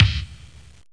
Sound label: drum